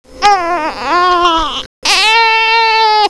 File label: crying